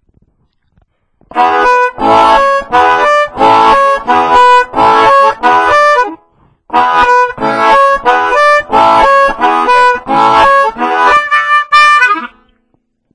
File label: clean, harmonica